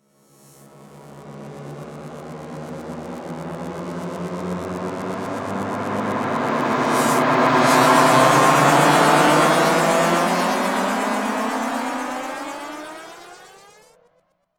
rise, Cinematic Trailer Samples; Bluezone Corporation; Titanium

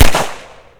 pistol fire; weapons; hl2

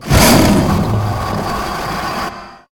hydra strike; hl2

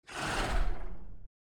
Dragon Mouth Flutter; Dragons; Hollywood Dragons

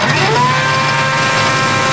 vertical start, crane; hl2; doors

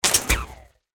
toon hit; 3maze; FINAL IMPACT

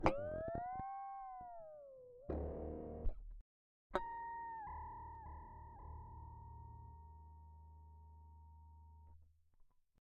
Whammy Bar Flutter, Clean, Metal Tensions; Rogue Waves

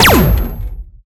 sci fi weapon cannon shot; BluezoneCorp

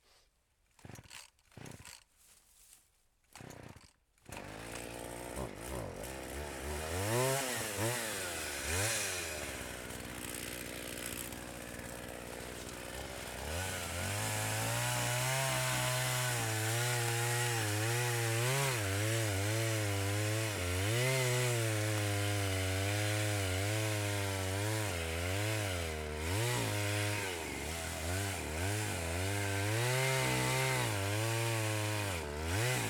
Chainsaw Working; QUAD; Mechanical Wave; Lumberjack

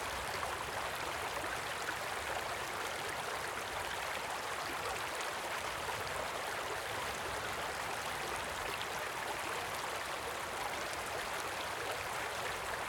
Calm River; Stefano Cremona; Rivers, Streams, Creeks

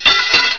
metal, debris

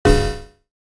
ERROR; Scoba Sounds; Scoba Ui Essentials

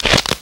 barnacle crunch; hl2

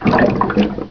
swim, player; hl2

